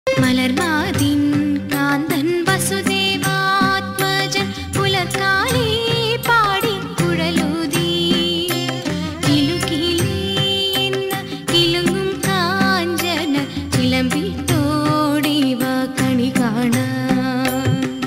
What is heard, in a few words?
vishu song